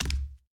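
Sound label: Foot Hard Wood Bare Land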